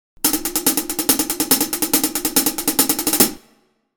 drumonderdelen

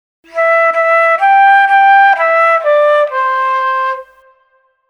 fluitmelodien

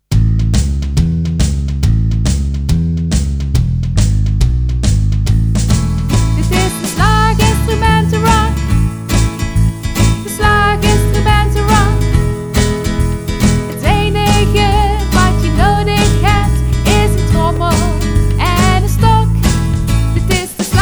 slaginstrumenten rock met zang

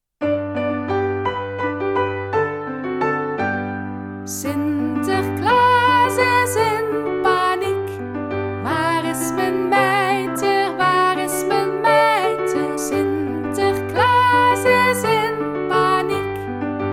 met zang

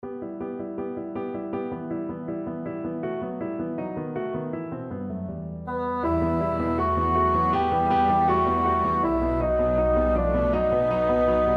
zonder zang

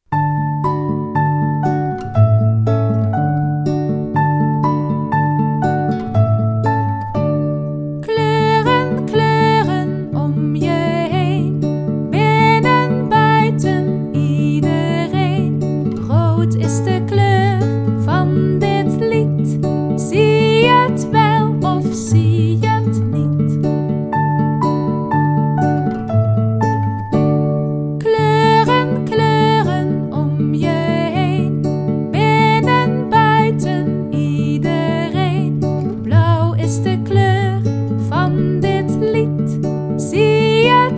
met zang

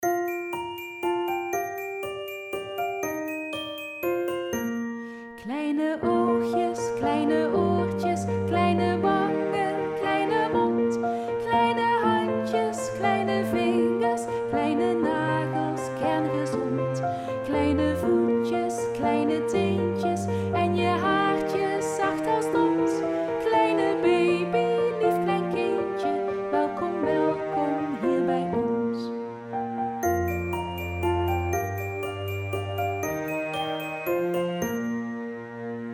kleine baby

